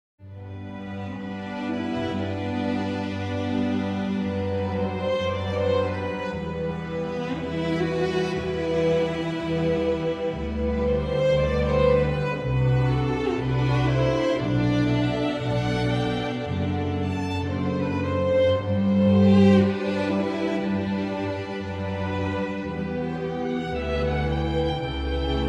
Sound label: air gavotte for strings